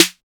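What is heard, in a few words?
808 HARD SNARE, HOUSE KIT; Drums In Your FACE!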